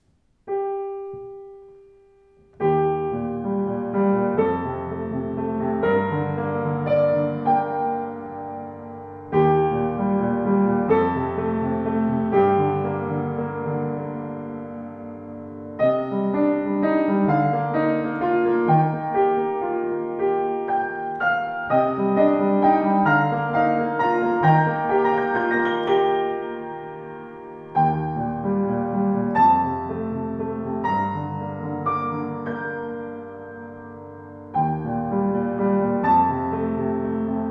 Sauter Pure Basic Klang, Klavier

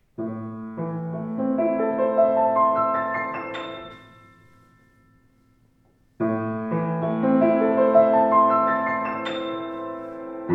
Foerster, klavier; 110 august foerster schwarz

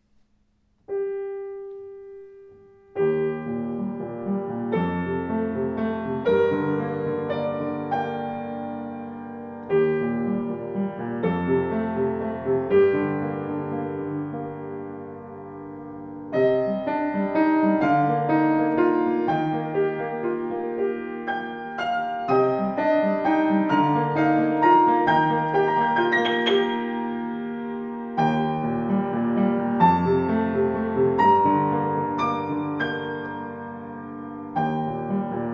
Grotrian Klang, Klavier; 124 Grotrian Steinweg Classic 1997 schwarz

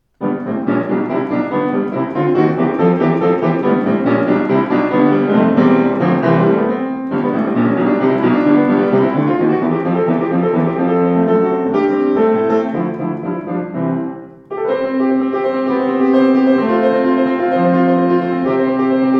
Bechstein Studio Rag, klavier; 115 bechstein studio eiche

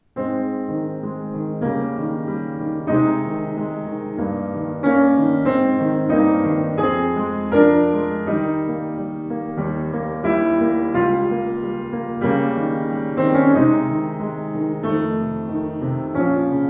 Schimmel Buche, klavier; 115 schimmel buche

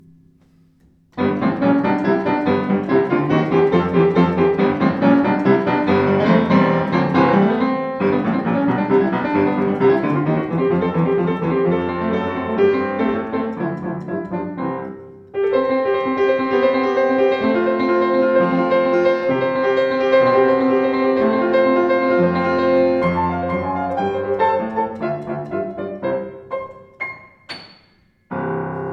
Grotrian Rag, klavier; 124 Grotrian Steinweg Classic 1997 schwarz

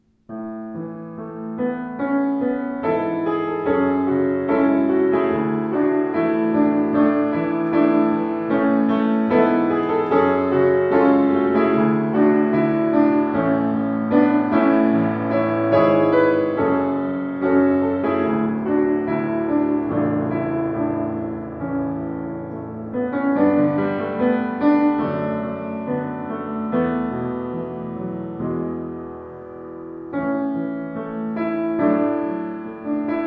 Schimmel Buche, klavier; 115 schimmel buche

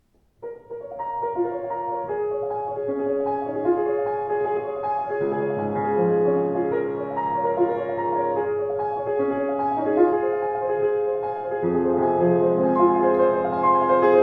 Foerster, klavier; 110 august foerster schwarz

